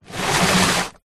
foley bottles glass empty in cardboard box slide wood floor